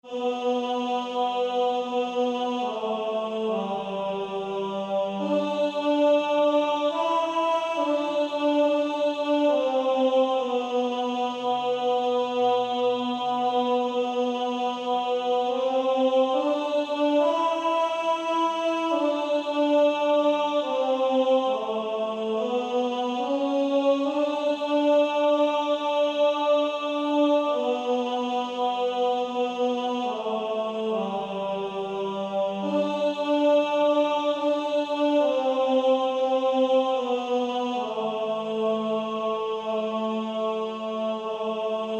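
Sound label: Male Choir, second tenor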